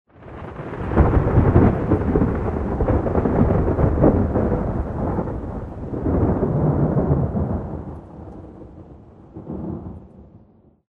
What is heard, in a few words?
rolling thunder; Source Engine; tf; lair